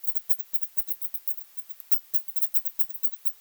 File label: Eptesicus nilssonii, batcalls